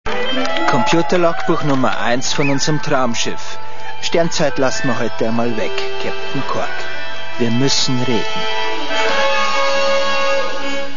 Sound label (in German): kork